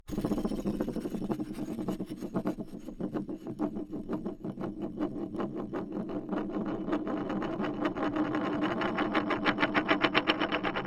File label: spinning, crescendo, wood surface, wooden tray, small, matte, rough, cupped, scruff; slight bounce; Eiravaein Works; Eulerian